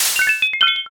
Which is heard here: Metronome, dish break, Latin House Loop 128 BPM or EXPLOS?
dish break